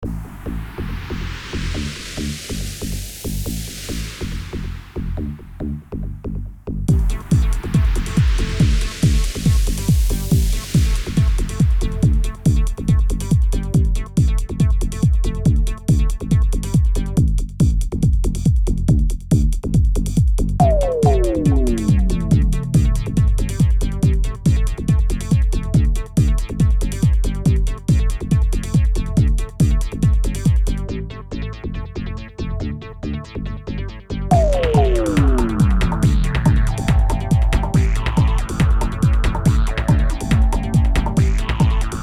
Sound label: electronic jungle fill